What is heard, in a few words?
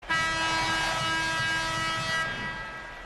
Buzzer; Bulls